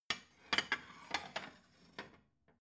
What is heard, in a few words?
plate shelve slide soft